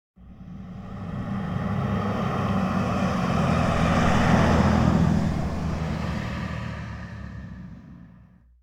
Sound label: Auto Sedan Passby; Automobiles